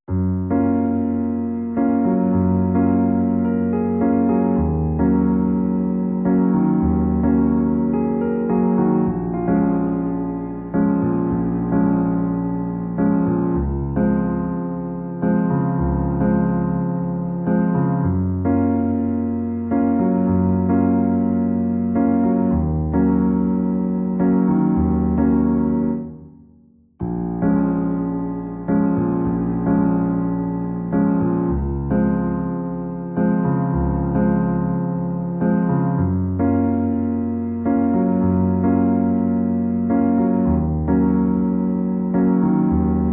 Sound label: Piano Version